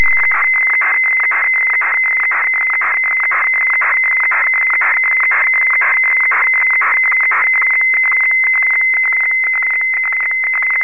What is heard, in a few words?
Fax